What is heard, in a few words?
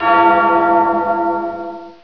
bell toll